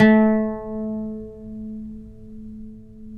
OUD A; Guitars; Spectrasonics; Hans Zimmer Guitars VOL-2

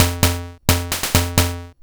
Tango, Hing-Hon EK001

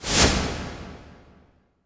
IMP WIPE, Cinematic Impact

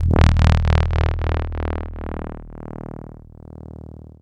Viral Bass, Drum n Bass; KJ Sawka; Drum n Bass & Beyond

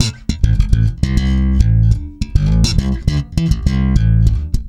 A, hard funk, bass; Spectrasonics; Bass Legends

